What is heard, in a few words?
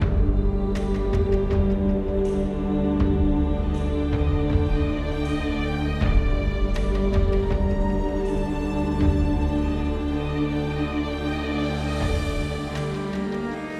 Dark Forest